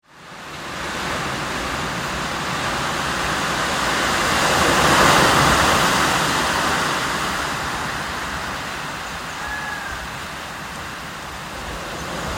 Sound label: Silent beach Sri Lanka